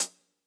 Acousti Hat Hit, hihat; ultimate hihat; Hits; AcoustiHat B

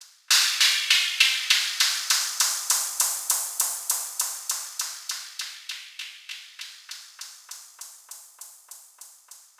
Echo Noise; Zero-G - Future Wave

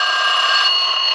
FX Loop, Drums & Drones

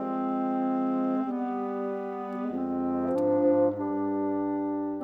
Bassoon Clarinet, Orchestral; Sonic Foundry (Sony Creative Software); Orchestral VOL-2; Modern 36

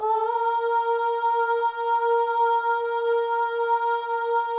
OH, FEM, Symphony of Voices

